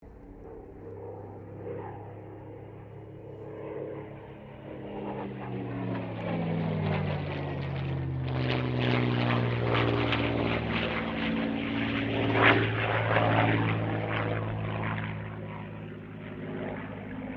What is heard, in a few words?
AIRCRAFT SUPERMARINE SPITFIRE MK-XVI PASS; Airplane; Airplanes; Vehicles